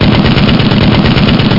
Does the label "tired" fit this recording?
No